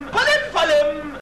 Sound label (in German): palim palim, klingelton